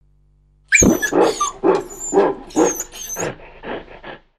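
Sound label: Dragon Whimper; LU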